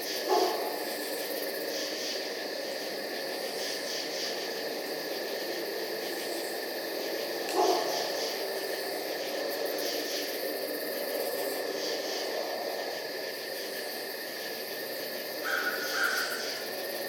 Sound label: eerie; Golden Crayon Sounds